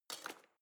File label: knife wood drawer place